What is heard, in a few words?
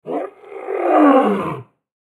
Lion Roar Strained Long; Big Cats; Big Cat Sound Effects; Animal Lion Roar Strained